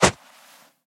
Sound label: hatchet attack; weapons; rust